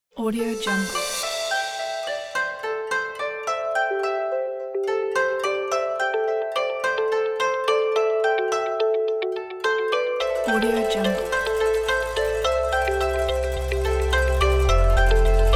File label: ambient technology